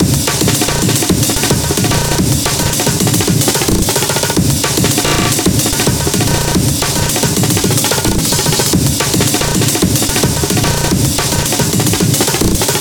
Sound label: Breakcore 210 Bpm